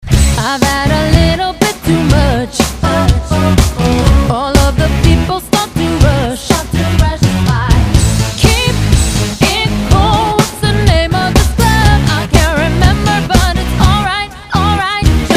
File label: Cover Band